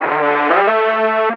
vocal twist, Dm; SD AURORA Sample Pack; Slate

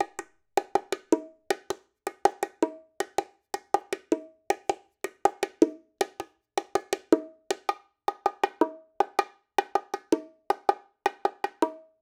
latin bongo loop 80

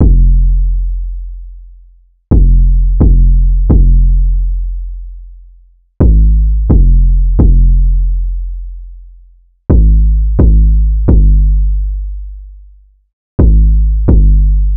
808 loop 130 Fm; Slate Digital BASSHOLE Sample Pack

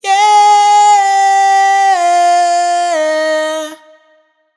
riff Yeah Harmony 125 Fm wet, vocal; Slate Digital Omnivox Vocal Sample Pack

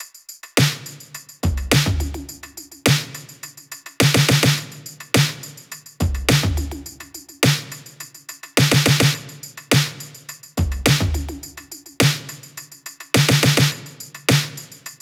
top 105, Drum Loops; Slate; SD MIDNIGHT Sample Pack; Shelby

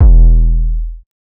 808 one shot E; Slate Digital BASSHOLE Sample Pack

